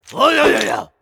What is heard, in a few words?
Shaman Attack